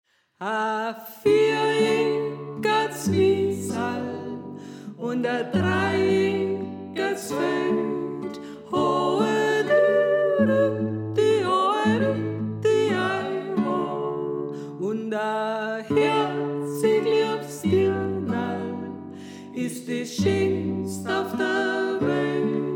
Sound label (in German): Sopran